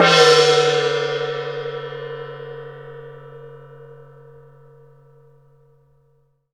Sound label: GONG; ILIO; Synclavier Percussion 2; World & Orchestral